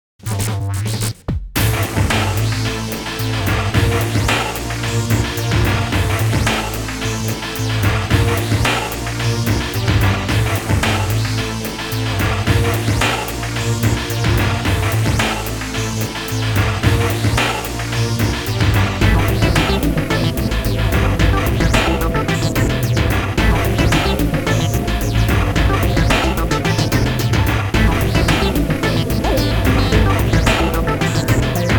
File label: Analog